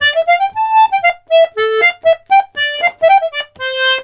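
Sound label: Accordions, polka; USB Soundscan vol.40; AKAI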